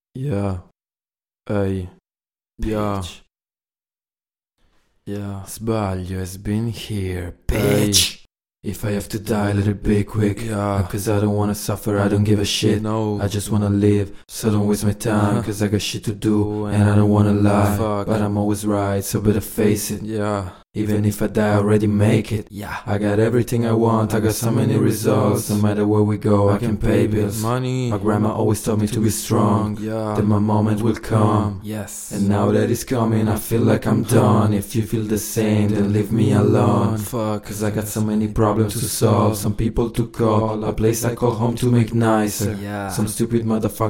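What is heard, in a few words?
hip hop 99 bpm Aflat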